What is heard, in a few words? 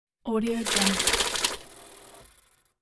the counting machine for the money; fatsound